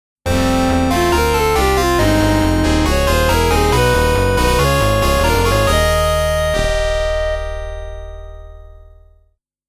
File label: Stage Clear; Section Z